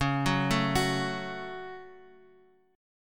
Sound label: C sharp Suspended 4th Sharp 5th C sharp down Guitar Open F; arpeggiated; JGuitar